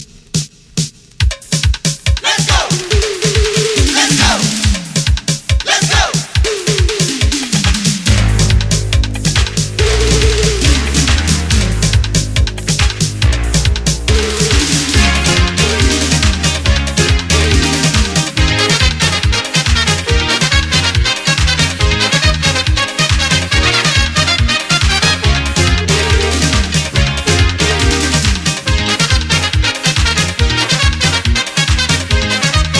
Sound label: soca